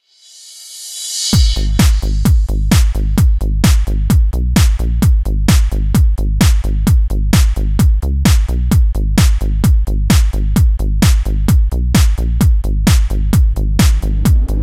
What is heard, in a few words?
Electronic